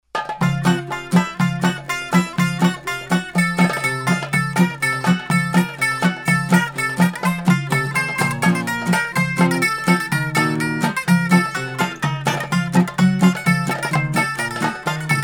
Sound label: fa majore greek trio